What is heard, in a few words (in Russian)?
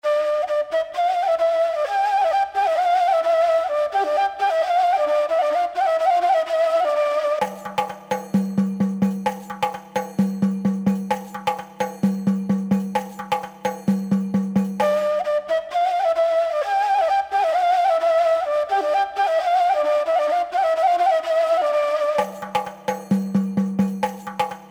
Etnicheskaya Grecheskaya drevnyaya muzyka